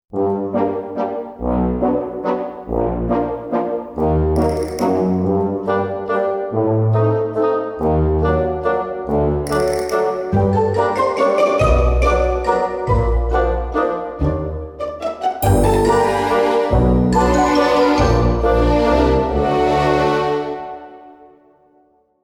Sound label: Waltz